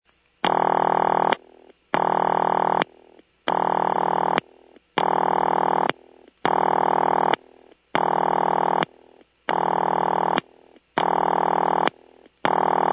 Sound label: Coded Ring (4 short); sounds from preserved step by step switches; Phil McCarter's Telephone Switch Collection